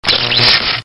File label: pain; hunter